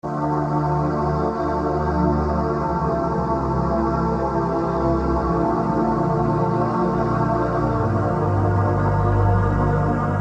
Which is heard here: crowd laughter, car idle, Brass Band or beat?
beat